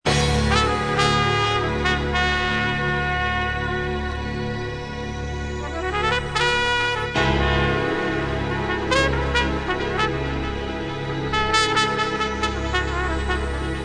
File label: Trumpet Thing